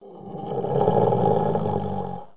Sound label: Wolf Growl; Zomboid 41.78.16; Project Zomboid